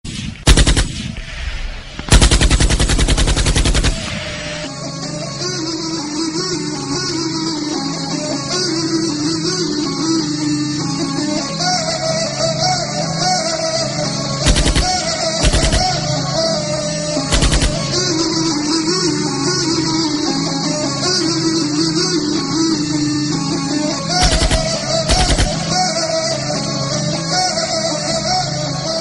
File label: Gunshots Flute